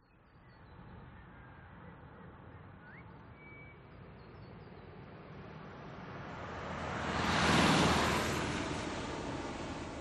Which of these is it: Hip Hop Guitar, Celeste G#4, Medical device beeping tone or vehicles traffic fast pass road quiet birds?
vehicles traffic fast pass road quiet birds